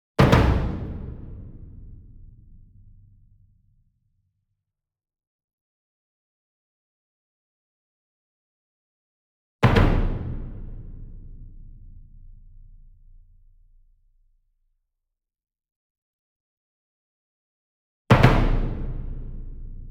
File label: SINGLE DRUM Verdi 66 Inch Double Hard Beater Medium; boom library; Cinematic Strikes Construction Kit